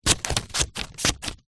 office scissors cutting paper; zapsplat

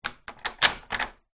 household door handle movements external front

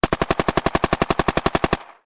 gun ak 47; still north media

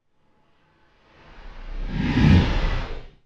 Whoosh Vocal; bottlerocket

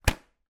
household vhs video cassette case close

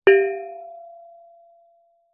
musical instrument bonang gamelan hit soft

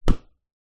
sport beach soccer ball bounce

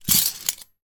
household several items of cutlery drop down

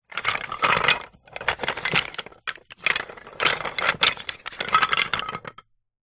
leisure toy train wooden track pieces movement

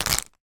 conkers many fast short movement in hand

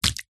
cartoon slime gloop drip fall hit surface

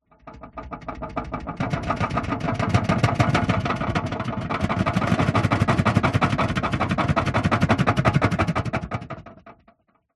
plastic file tray unit shake earthquake; office

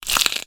foley footstep broken glass single; zapsplat

